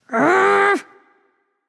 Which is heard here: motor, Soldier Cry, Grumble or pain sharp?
Grumble